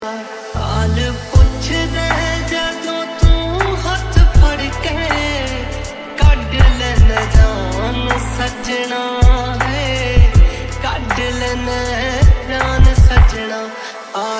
Punjabi song

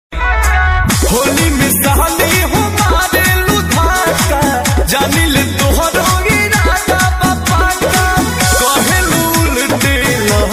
Holi Song

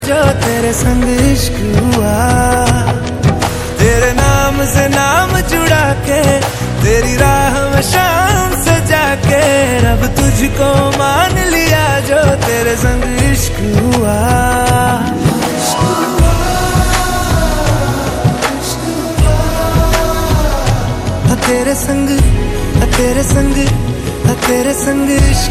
song

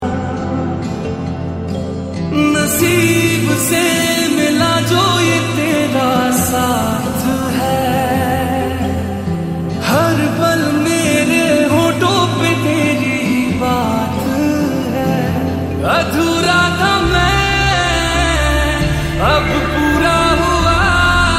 slowed reverb lofi song

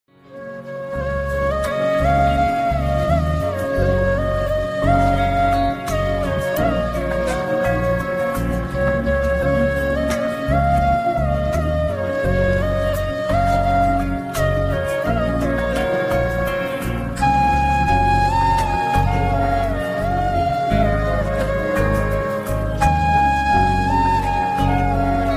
Flute BGM

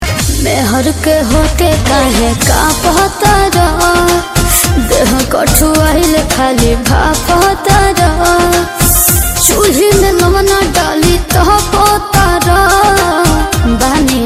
Song

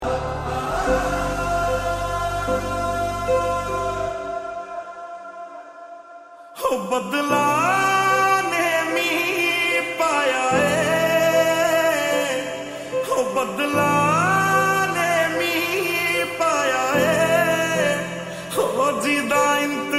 lofi slowed reverb; Ranbir Kapoor; animal movie

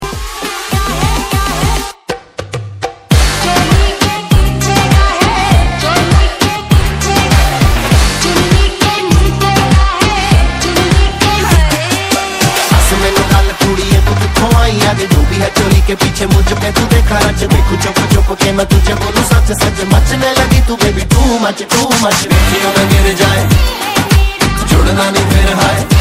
Ringtone instrument

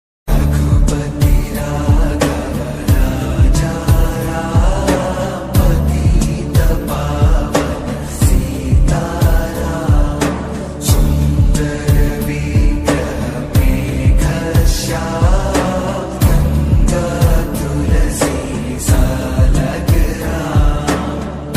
Bhajan

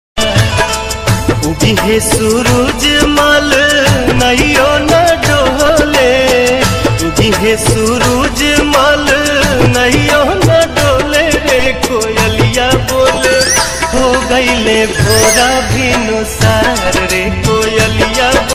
Chhth Puja

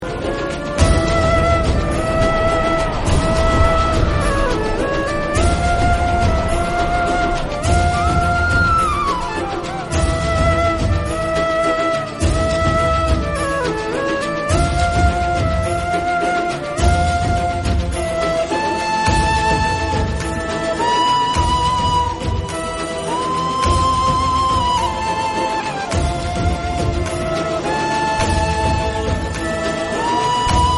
Flute Music

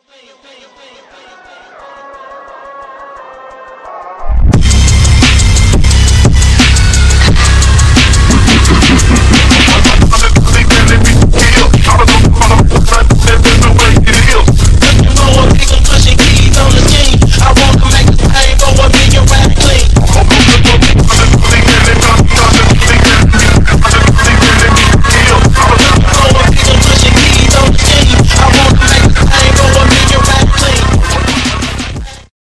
Phonk Sound

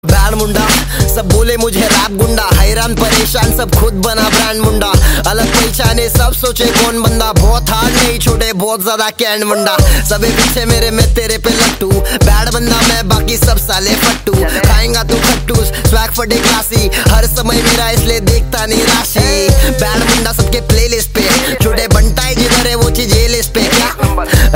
Rap

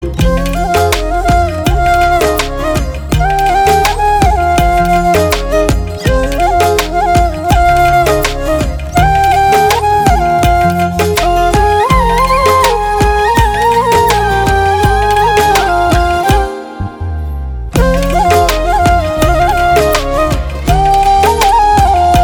BGM Instrumental

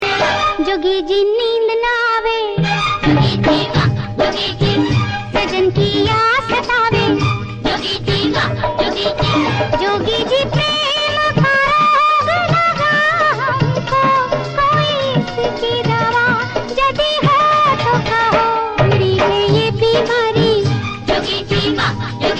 Holi song

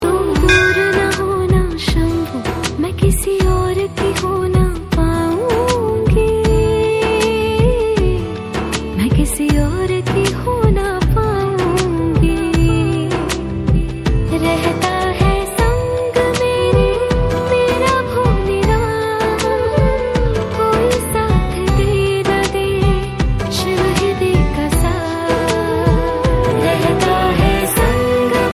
New Song

